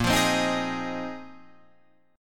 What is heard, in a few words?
A sharp 7th Suspended 2nd Suspended 4th, Guitar Drop D chord